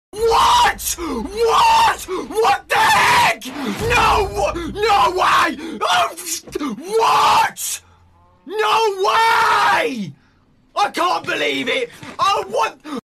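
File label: screaming man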